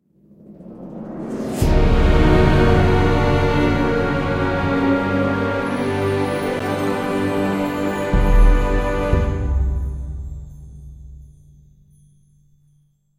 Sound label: Success Sting; Jurassic Park The Game